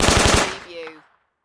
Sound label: Submachine Gun Sten